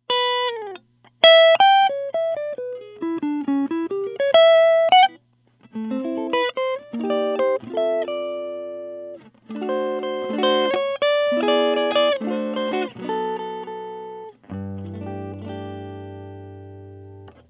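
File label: guitar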